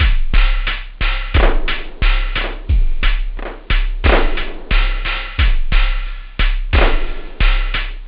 acid, techno; OctaMED SoundStudio V1

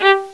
fiddle, string instruments; Sound Effects for Multimedia - Volume 1

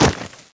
dirt; Microshaft Winblows 98; Parroty Interactive

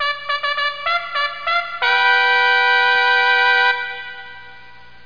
FANFARE

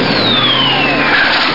BOMB; batlords; c't freeware shareware 2001 January

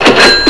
Mult Appears; Apeiron

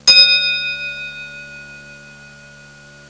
fight bell, percussion instruments; Sound Effects for Multimedia - Volume 1

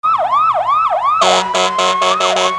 FIRE SND; KidPak

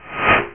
Turbo Speed; Bedlam

